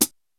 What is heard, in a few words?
808 KIT; Rubber Chicken Software Sample Bank